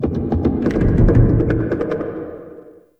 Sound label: Distant Clicking; Ambience; Warframe; Duviri; Murmur